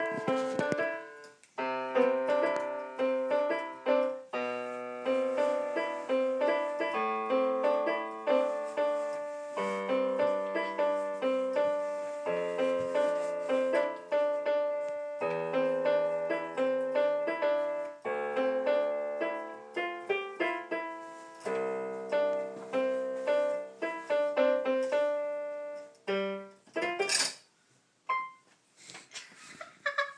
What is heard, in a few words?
7yo s piano piece